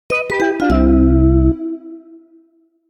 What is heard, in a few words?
Lose; Boost Pad Bonanza; Mini Mario & Friends amiibo Challenge